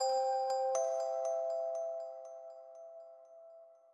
outgoing call

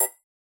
error

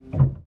piano release; samples-piano

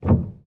piano release; samples-piano

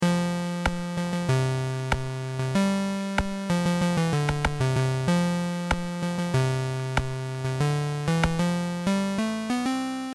song

